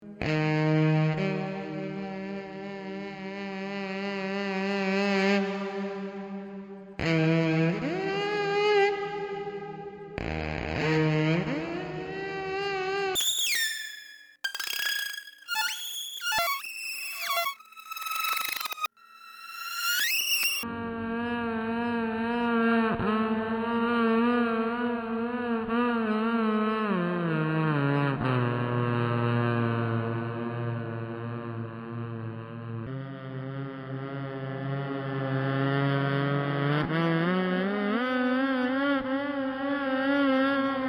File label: noise